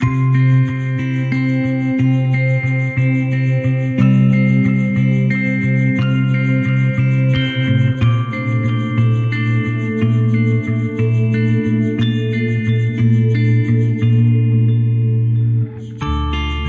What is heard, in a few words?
Burst Bubbles